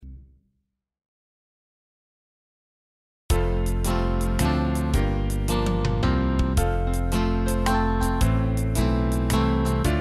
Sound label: yellow rose waltz